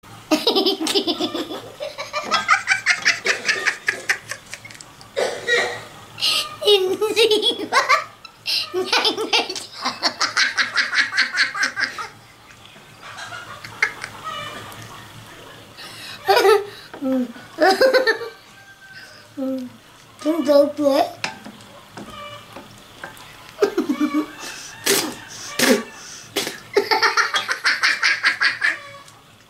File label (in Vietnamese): tieng cuoi cua mao de de